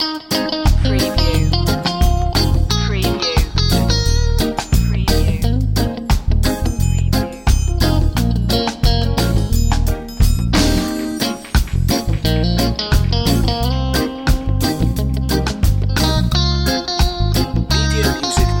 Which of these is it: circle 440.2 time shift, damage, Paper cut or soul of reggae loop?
soul of reggae loop